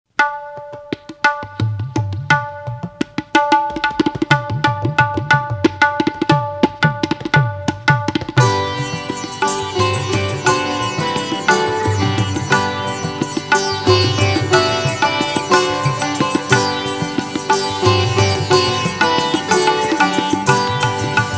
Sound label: dhun; Dhun in Dadra Tal